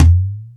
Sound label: House Machine, Drum Machines